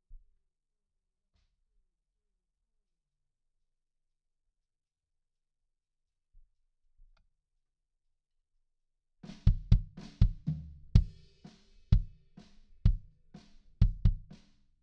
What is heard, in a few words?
BD IN